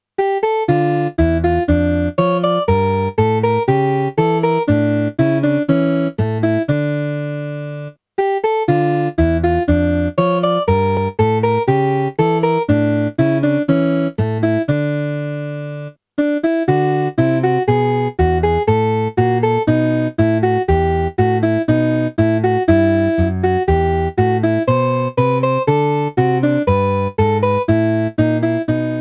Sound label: Bourree